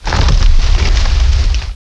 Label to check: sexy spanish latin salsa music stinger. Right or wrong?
Wrong